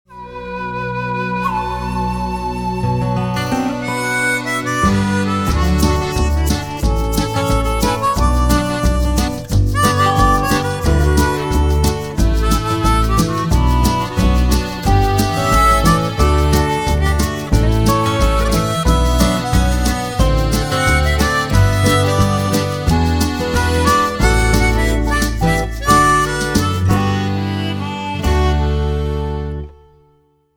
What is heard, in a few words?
wild west guitar